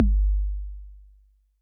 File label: mute toggle